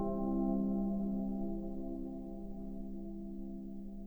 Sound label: harp